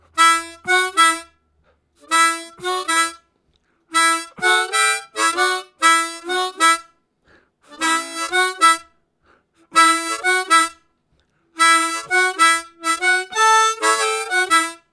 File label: Oct Q, Harmonica Riffs; Zero G